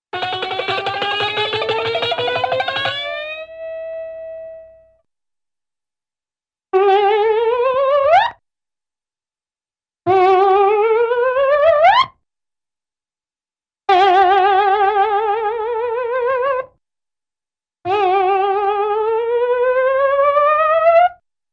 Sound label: Guitar Glissando & Twang; Hollywood Edge Cartoon Trax